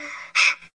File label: gasp